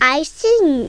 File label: I sing; The Cyberplasm Formula; More Fun!